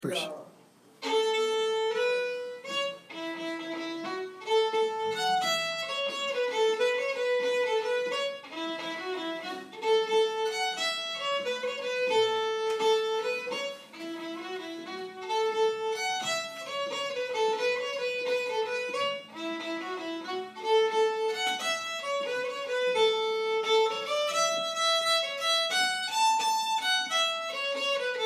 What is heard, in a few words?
Jig In A; 100 Pipers